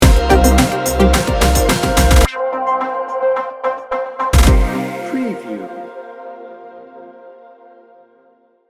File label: fashion week sting bumper